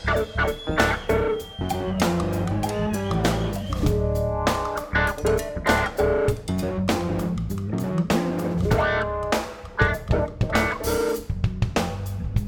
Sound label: Space Funk; Mongollon Brewing Company Flagstaff AZ USA